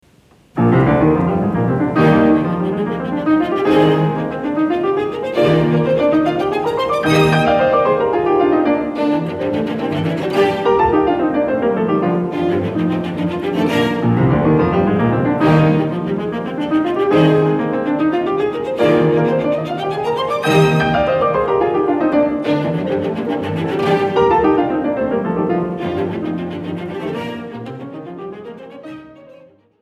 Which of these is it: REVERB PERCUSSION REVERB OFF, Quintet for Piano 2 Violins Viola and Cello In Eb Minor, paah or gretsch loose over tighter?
Quintet for Piano 2 Violins Viola and Cello In Eb Minor